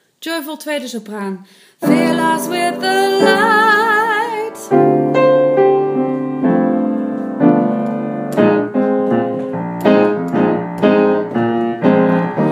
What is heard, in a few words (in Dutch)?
Sopranen; Joyful